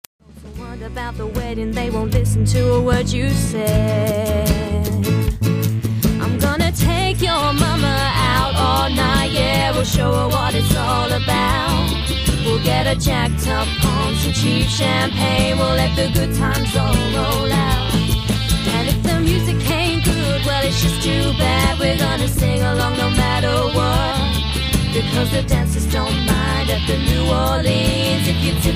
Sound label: Female Pop